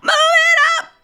MOVE IT UP, FEMALE VOICE; Best Service; Hallelujah

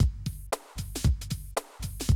Classic Drum Loops

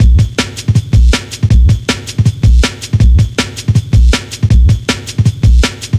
160, Drum Loops; Total Drum&Bass

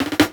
Snare Roll; EXOLINGUISTK; Brooklyn Feels

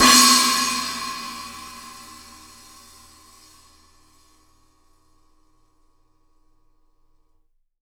SWISH 16 FF, Drums; Big Fish Audio; Ross Garfield Drums VOL-2; TUCSON KIT

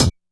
hihat, Trancefunk 134 F#min; Big Fish Audio; Hardcore Breakbeat Action; Trancefunk Mutator

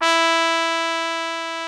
trumpet E3; Greytsounds VOL-1

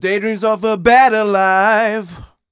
m 127 mel a, Vocals

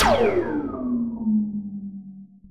laser brr

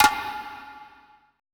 E CGFLAME, ETH DRUMS

